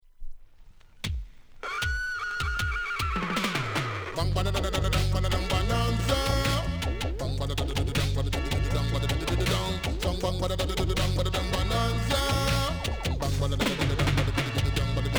REGGAE

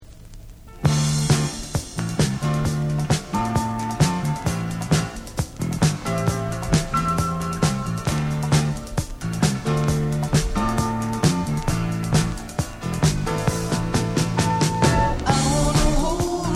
DISCO HITS AMERICAN

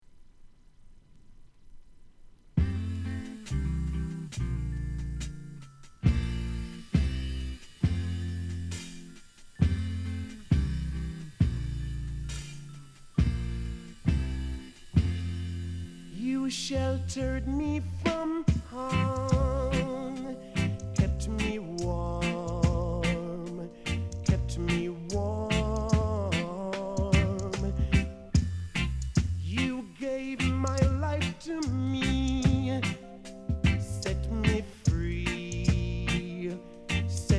REGGAE

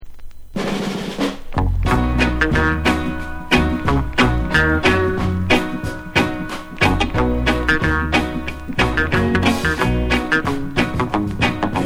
reggae

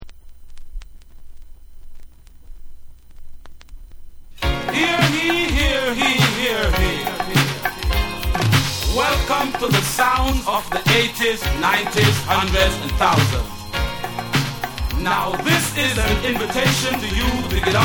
REGGAE MATIC FUNK

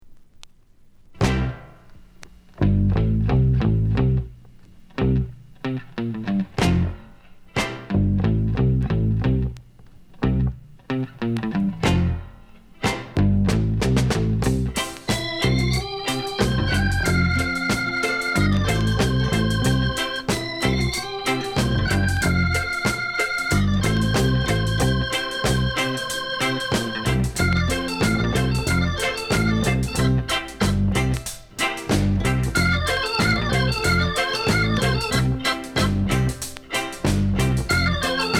REGGAE

